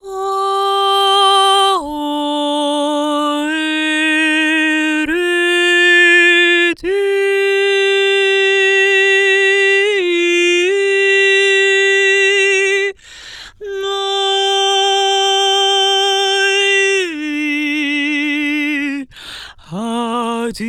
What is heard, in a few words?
Main Vocals - Cm 70bpm; Construction Kits; Ghosthack; SkyRealm; Kit 19 - Ode To Daenerys